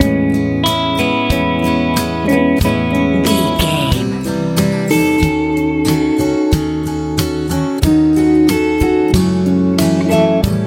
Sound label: pop ballad